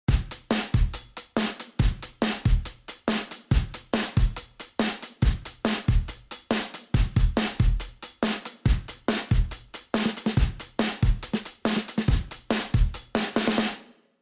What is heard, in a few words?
drums; artsacoustic cl series